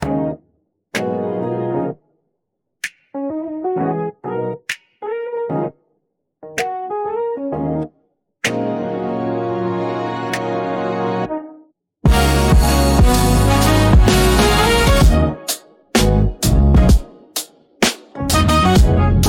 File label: jazz; sonilo v1.1; text-to-music